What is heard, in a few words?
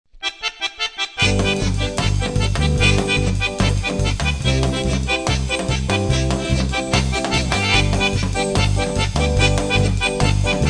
Conga, latin